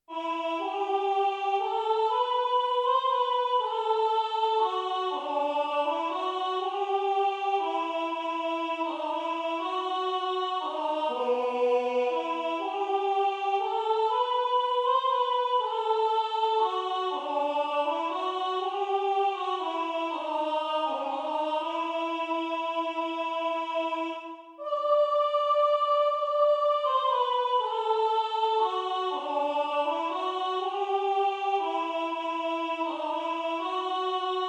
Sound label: soprano